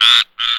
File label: Exterior Random Critter